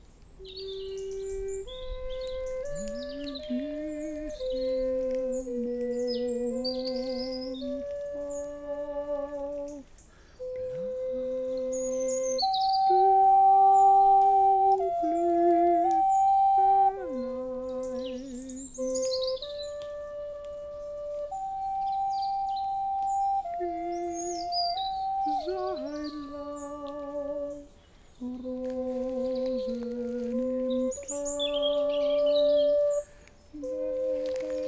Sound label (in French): cabasse; collecte de paroles